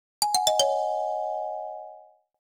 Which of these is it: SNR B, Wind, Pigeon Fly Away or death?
Wind